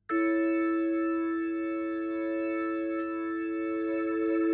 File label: Hammond Leslie